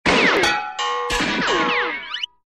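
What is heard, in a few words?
cartoon shot with ricochet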